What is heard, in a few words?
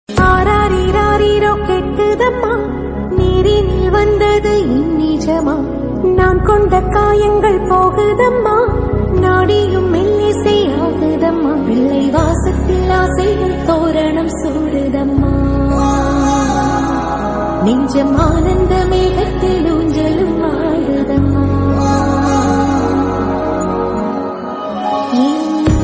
Mother Song, Tamil Ringtone; Varisu